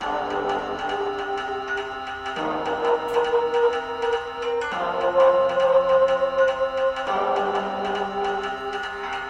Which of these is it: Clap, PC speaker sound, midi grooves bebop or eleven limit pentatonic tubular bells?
PC speaker sound